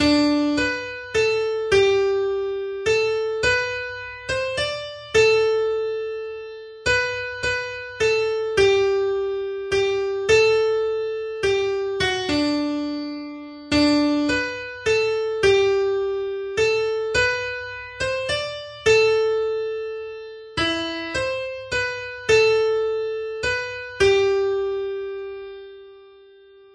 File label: little slow Waltz; Midsummer Waltz